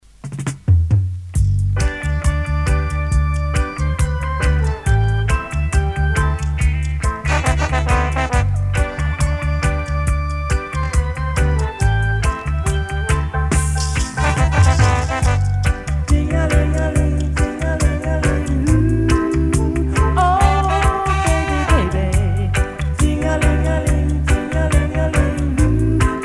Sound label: horns